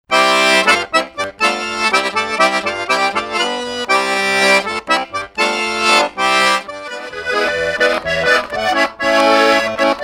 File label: Accordian